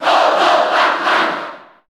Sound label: Cheer Japanese; Pac-Man; SSB4; SSBU